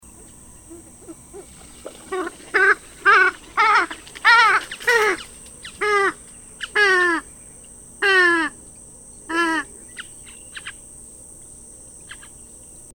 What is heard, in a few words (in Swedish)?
Purpurhona